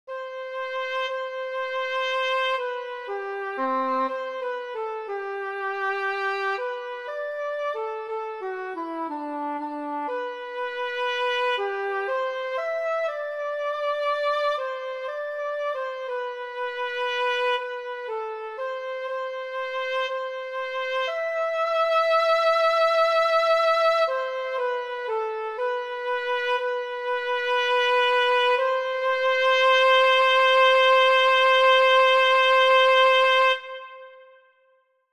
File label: alto; 2026 spring concert